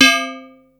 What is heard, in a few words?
gamelan, Yamaha Motif ES drum machine; 300 Drum Machines; Yamaha Motif ES-Series